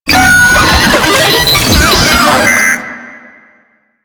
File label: Brachiolyst Idle, Vocals; Enemies; Warframe; Proto Infested